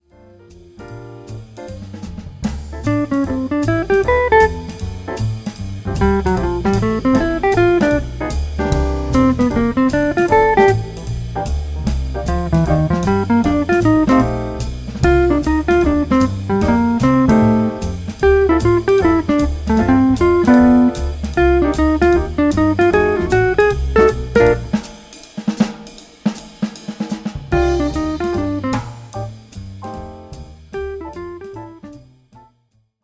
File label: Guitar Rhythm Section; Quincy Street